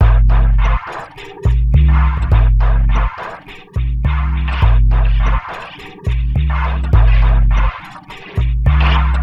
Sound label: Metals Loop; Sonic Foundry (Sony Creative Software); Esoterik Beatz